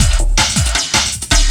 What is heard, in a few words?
LOOP, 160 BPM, Drum & Bass